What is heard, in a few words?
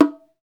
BONGO, Roland; Club-50 - Foundations Roland; Hybrid Kits; Thin 3